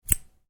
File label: Scissors snip, Scissors: Short Hard Snip; Miscellaneous Household; Stuart Duffield